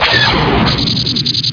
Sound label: Empire Laser Ch Ch Chhh; XWing